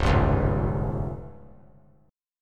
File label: C sharp Major Flat 5th; C#Mb5 strummed; JGuitar